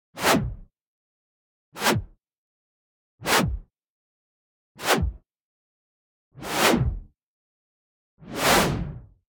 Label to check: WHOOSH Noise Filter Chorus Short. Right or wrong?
Right